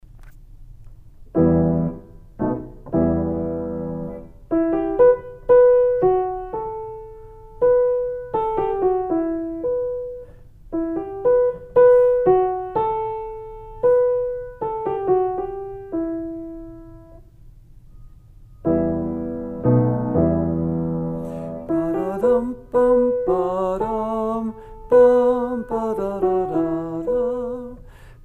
Polish Folk Song

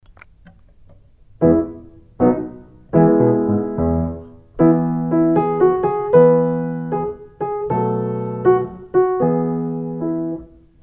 Dutch Folk Dance

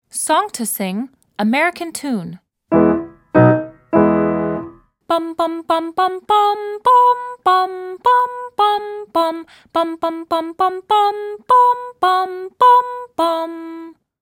Song to Sing

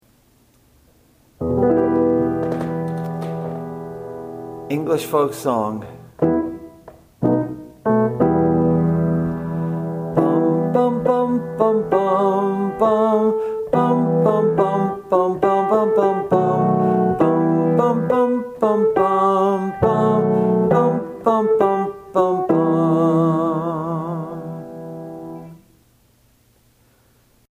English Folk song

